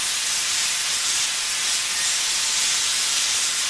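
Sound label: steam loop; shadman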